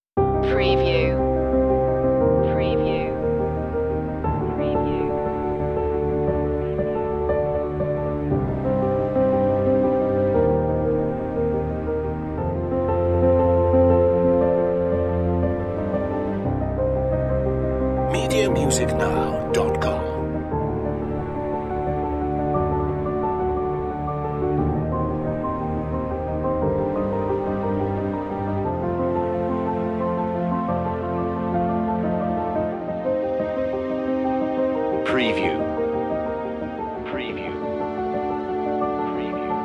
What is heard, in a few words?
motion no perc version